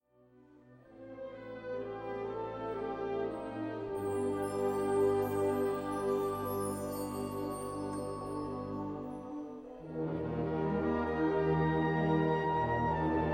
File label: Festival Music